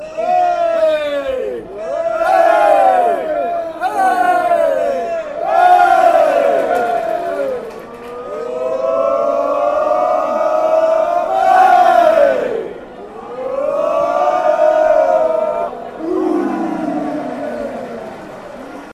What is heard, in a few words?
waaay, fanchants